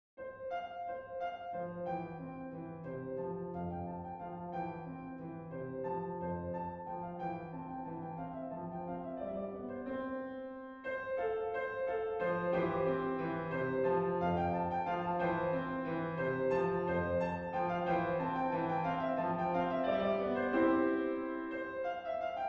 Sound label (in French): gavotte majeur; corelli arcangelo